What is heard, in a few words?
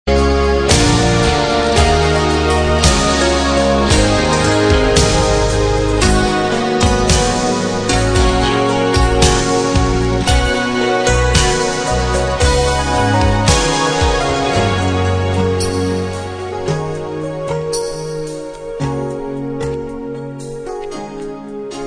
instrumental